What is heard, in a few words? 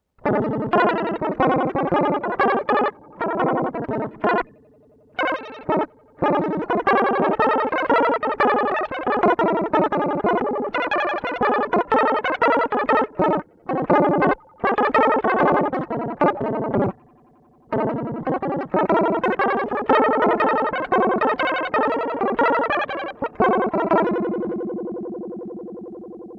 slow vib